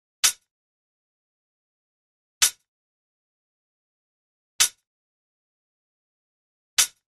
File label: Ambient House Hat 110BPM